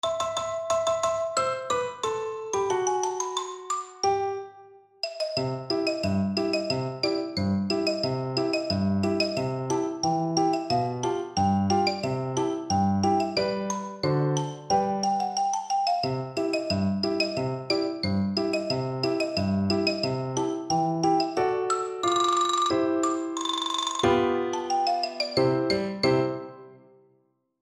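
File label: xylophone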